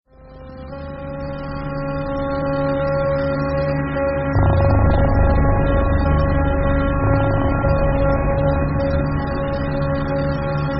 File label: Ambient